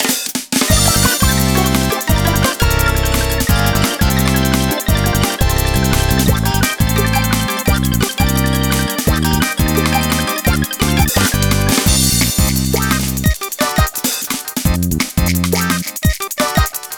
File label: Comical; Happy Dance Collection; Wii